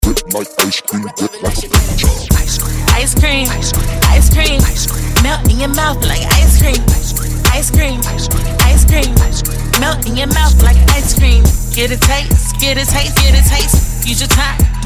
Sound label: Dj Intro Outro 105 Bpm